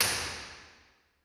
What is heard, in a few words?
Real Places Foyer L, Impulse Responses; Foyer Imakolata BA; Ableton Live 12 Lite; Hybrid